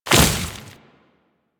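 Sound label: Step Vegetation, Footsteps; Enemies; Warframe; Grineer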